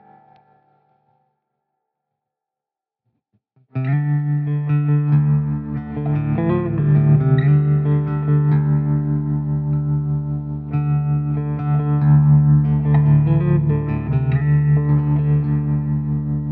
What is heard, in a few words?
bells tone theme